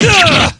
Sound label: damage; kanji